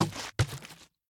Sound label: Drop Bleach; The Inn